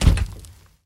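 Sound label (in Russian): глухой сильный хлопок дверью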